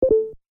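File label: facebook notification ringtone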